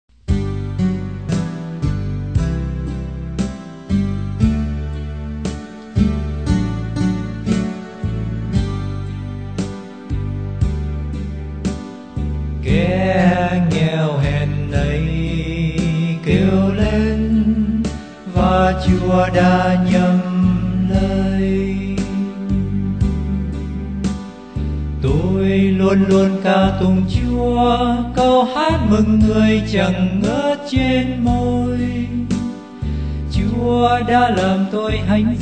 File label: BASS